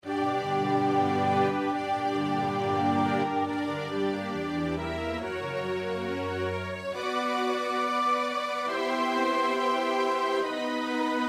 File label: Lord, Thou hast been our refuge (S-A-T-B), Groot Koor; Elgar (Edward); The dream of Gerontius